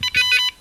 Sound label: success; buzzer; Curtis Electronic Code Switch